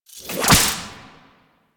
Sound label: Exalted Whip Unequip; Warframe; Warframes; Khora